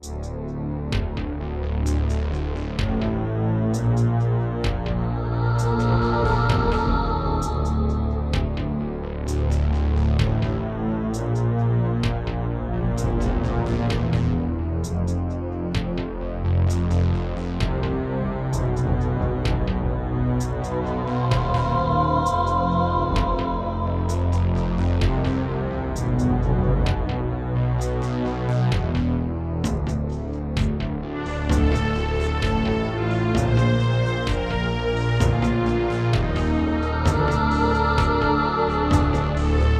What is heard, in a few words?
Yamaha DD-55; Descent II - Briefing